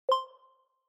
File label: Inspiration Low